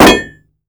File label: Bullet hit metal; DoomRTX